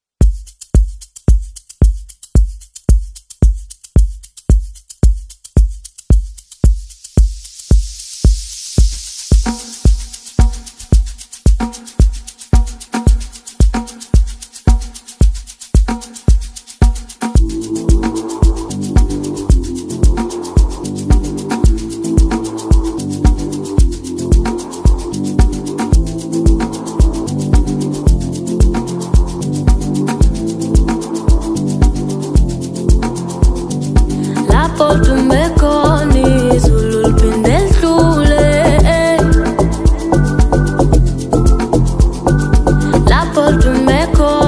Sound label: Log Drum